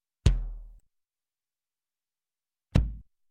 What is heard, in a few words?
Bass Drum single Kick, Music, Percussion; Sound Ideas; Series 2000